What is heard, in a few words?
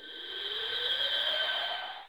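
electric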